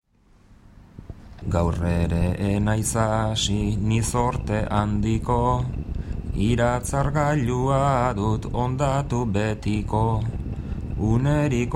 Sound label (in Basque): bertsos